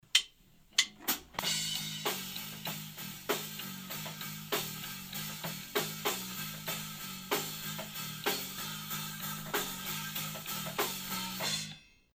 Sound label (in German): Groove Drums Bass mit Ear Box